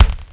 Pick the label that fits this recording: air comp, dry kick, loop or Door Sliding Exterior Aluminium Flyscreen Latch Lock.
dry kick